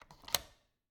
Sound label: Cabin, front door, deadbolt, lock, latch, thick, open, wide; Eiravaein Works; Vaeyan IV